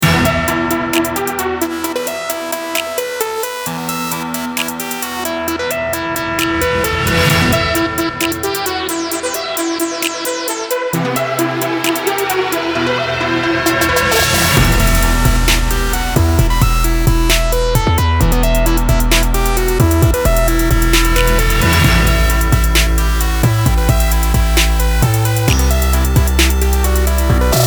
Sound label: vintage synth short plucky synth; apple logic pro